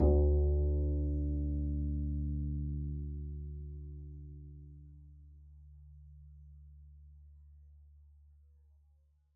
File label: pizz d2, double bass; dsmolken